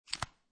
Cell Phone Flip Open Razor, Technology, Cell Phone, Flip Open, Motorola Razor; Cell Phones